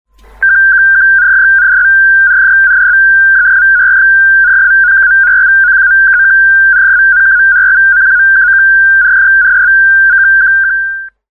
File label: FSKHell-245, digimodes